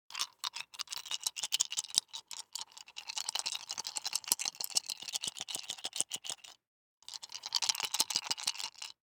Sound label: DICE in Two Hands, Shake, Five Dice; Samples; ArticulatedSounds; DICE Sfx Party (Extended)